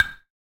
Perc, Percussionz

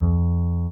chord

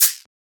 Shaker

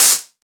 Open Hat; FLPRO

